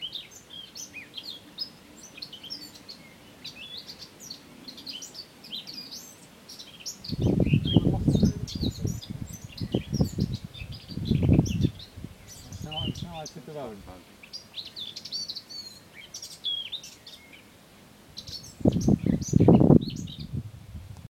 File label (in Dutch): Tuinfluiter